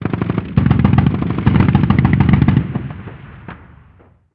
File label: gun usassault med